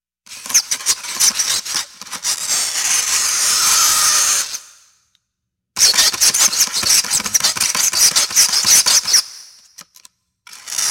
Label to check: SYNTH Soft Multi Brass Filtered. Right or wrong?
Wrong